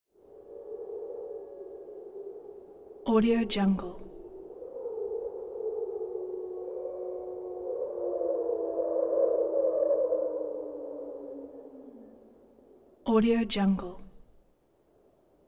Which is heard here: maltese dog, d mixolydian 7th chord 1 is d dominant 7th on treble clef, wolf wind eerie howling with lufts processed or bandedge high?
wolf wind eerie howling with lufts processed